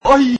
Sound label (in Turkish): ayy; kemal sunal